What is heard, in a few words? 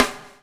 BUBL, BUBBLE; Spectrasonics - Backbeat